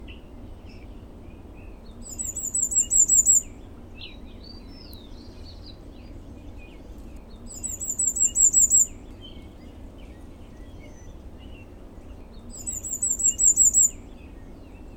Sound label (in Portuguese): Saíra-viúva (Pipraeidea melanonota); en pleno verano; Un sorpresa este pajarito en pleno verano!